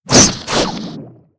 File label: Siren Typhoon Attack; Seer; GamigoNA